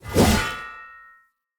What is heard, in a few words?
Great Sword Whoosh Heavy; Weapons; Player; Mendicant Great Sword